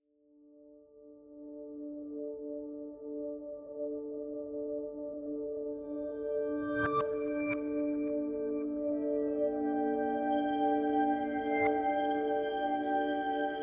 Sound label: Atmo Bed pads only; Atmo Bed C; Album: Tension Moods